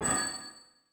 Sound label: Boss Chain Blink; helltaker